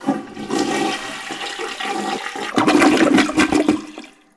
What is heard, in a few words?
Toilet Flush; SFX Kit; Foley - Misc